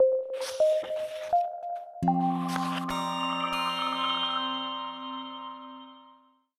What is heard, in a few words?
Upgrade Shine; murder mystery machine; ps4; xbox series xs